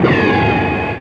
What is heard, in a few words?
RICOCHET